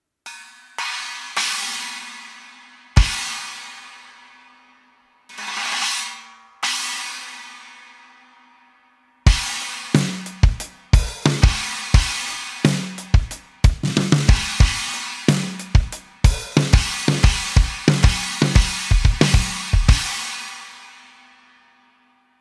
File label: 18 AA Chinese, cymbal; sabian; 18 inch; 21816; aa china cymbal